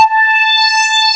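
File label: sweep hi-hat, ANALOG; CMI Sound Library Rev 1.3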